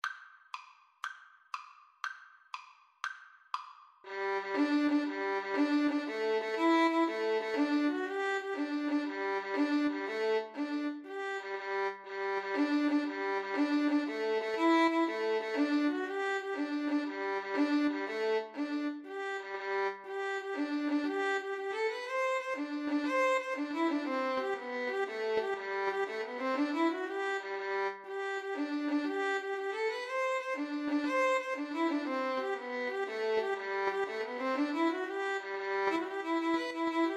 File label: irish jig medley Duet, Violin